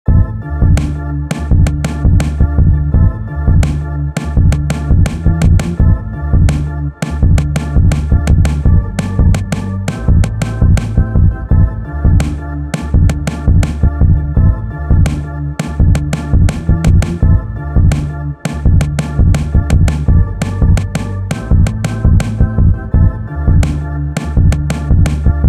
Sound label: Sinewave